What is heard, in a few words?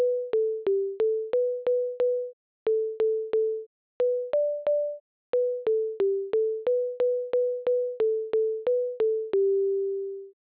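Snake Instrumental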